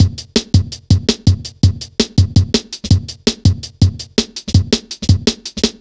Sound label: 165, drum loops, drum & bass